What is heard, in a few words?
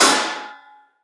bullet impact metal